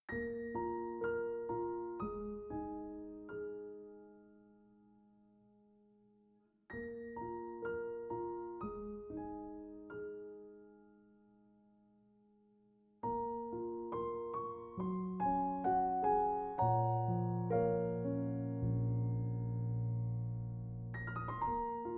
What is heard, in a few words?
Piano; Piano Collection; Katawa Shoujo